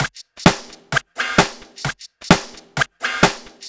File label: Electro House, 130 BPM, Percussion; Sonic Foundry (Sony Creative Software); Underground UK House & Electro